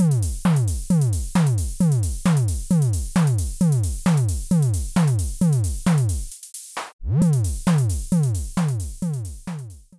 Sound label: reverse percussion